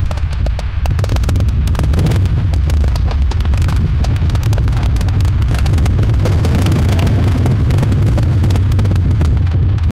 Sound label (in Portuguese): cavalaria correndo no cam, horses